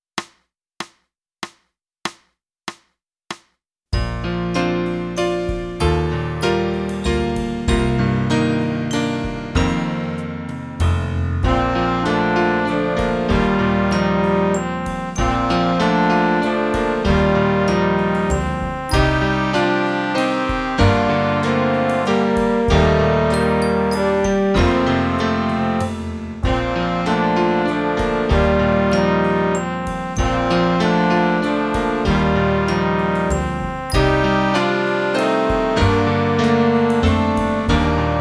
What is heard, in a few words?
flute